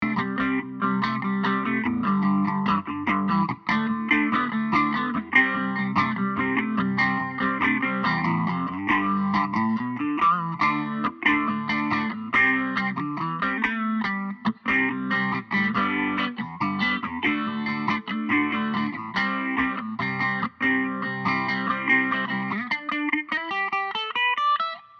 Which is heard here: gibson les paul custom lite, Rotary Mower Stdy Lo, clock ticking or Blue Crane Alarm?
gibson les paul custom lite